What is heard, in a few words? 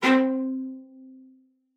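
cello spiccato C5, cello; Xsample VOL-5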